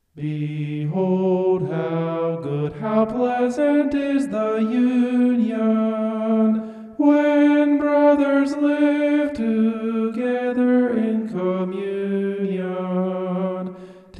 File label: vocal homoph; psalm 133